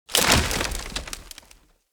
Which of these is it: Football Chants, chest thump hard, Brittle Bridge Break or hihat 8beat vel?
Brittle Bridge Break